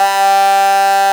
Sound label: RAVE BEEP; Best Service Alpha Dance vol.2